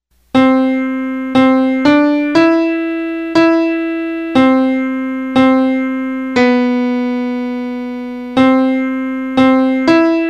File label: alto; chester